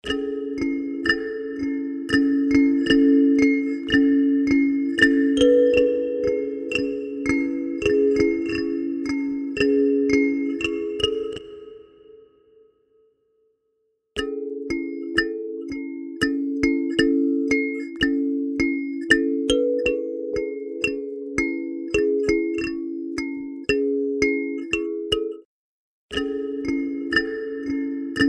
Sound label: SP2016, kalimba